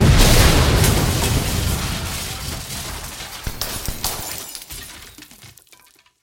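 beshenij tyazhelij vzriv s razletayushimisya steklyannimi oskolkami, violent heavy explosion with flying glass shards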